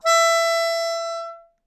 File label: Melodica Long, Long Notes Chromatic